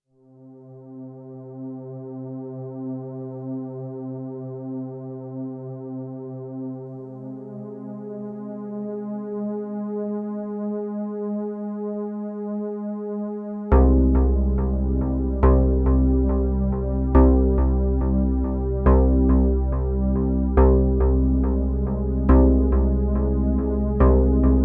ROLAND JUNO 60 Dark Time Mode

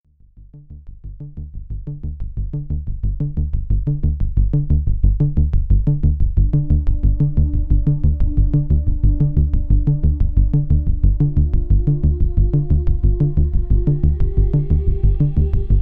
ANDROMEDA A6 Bass Sequenz

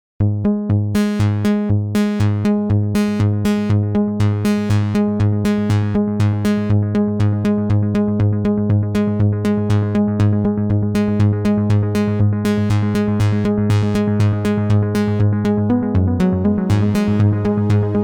ANDROMEDA A6 - Sound

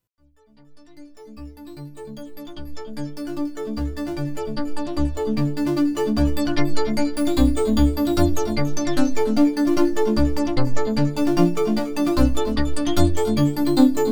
ANDROMEDA A6 Step Sequencer

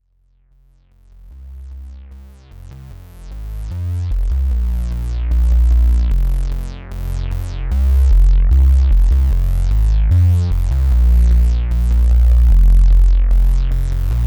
ANDROMEDA A6 Bass Lead Sound

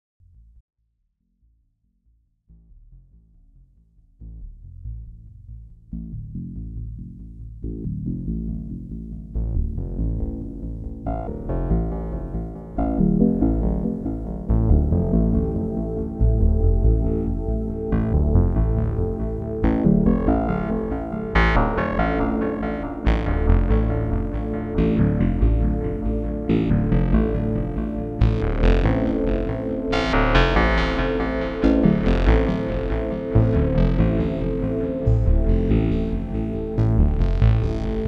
ERICA SYNTH

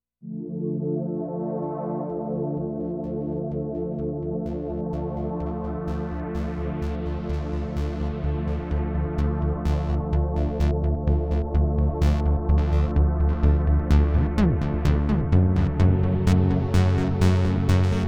ANDROMEDA A6 Melody Lead

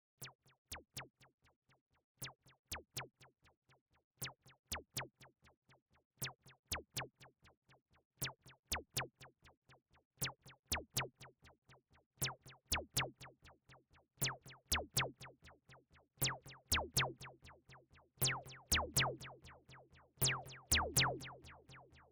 MOOG MOTHER 32 Filter-Resonanz

